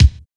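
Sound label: lowdown kick; NATUR. K1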